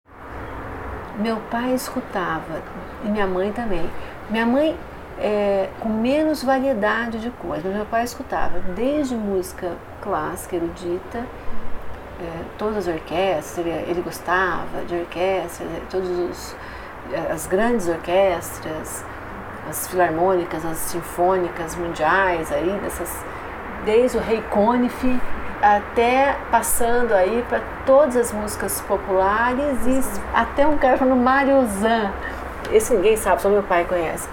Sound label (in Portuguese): Musica Infancia